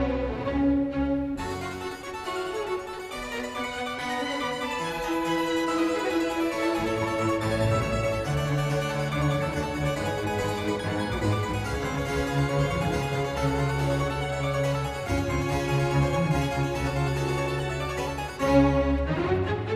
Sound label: harpsichord scarified